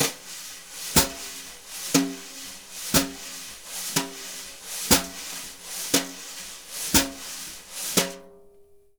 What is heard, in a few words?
BRUSH, Jazzistic; Univers Sons